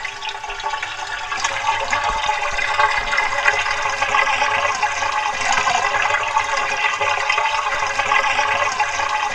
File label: DRAINING; Rarefaction; Poke in The Ear With A Sharp Stick